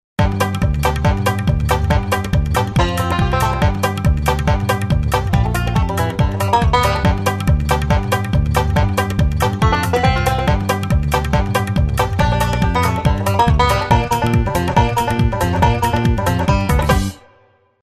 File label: Motorola; A3100